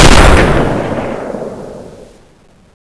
ak47; weapons; ak47buff; cstrike

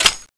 crossbow clip out, weapons; crossbowex21; cstrike

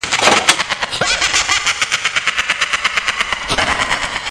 ghost; jbextreme

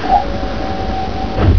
alien door; cstrike; kz kzsca toonworld3

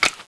clip in; weapons; cstrike

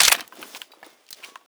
SG552 clip out, weapons; cstrike

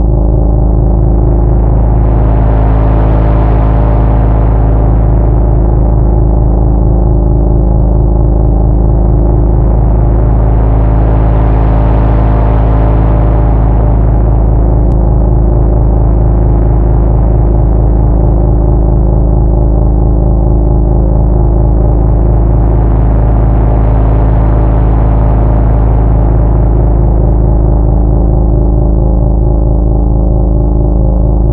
loop; kzra wired ez

